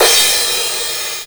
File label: Roland CR-1000, Drums